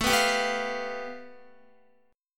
A 7th Suspended 2nd Sharp 5th A down Guitar 4F,4A,5C,5G,5C,6E; A7sus2#5 strummed